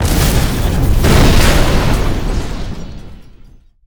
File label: Fire Ignite Large; Destruction